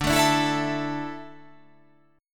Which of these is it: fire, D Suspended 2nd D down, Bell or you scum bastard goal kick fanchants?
D Suspended 2nd D down